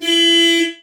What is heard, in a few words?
horn bus; best service Blue Box